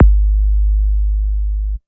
bass drum deep, techno trance drums

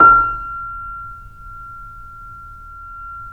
C7 PP, The Ultimate Piano Collection; East West

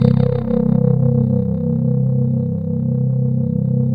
PPG C1, OLD PPG; Sound Gallery - Charged

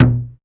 UGANDA DRM, UGANDAN

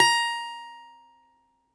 BALALAIKA, Prima Balalaika, Plucked Strings

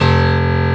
f1-a#1, piano, grand; Giga Samples Collection; ARS Grand

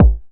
BD, Dance Mega Drums, BD HOU; Best Service

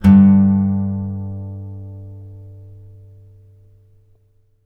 5ths G2, Blues Guitar; ILIO; Fretworks

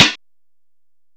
HH CLOSE, CYMBALS; M8; CMI Factory

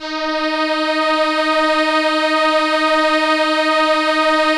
ARP STR ENSL, First Call Vintage Keyboards; SE ENSMBL

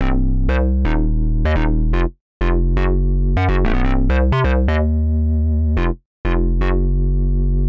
125 bass C; Basses; PowerFX - Nu Jazz House

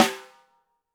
Snare, Roland TD-20

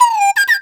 CHINA, Heart of Asia; Spectrasonics; CHINAFLT

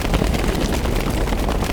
HUM RUNIN, Foot & Mouth; Roland LCDP14 Africa VOL-2

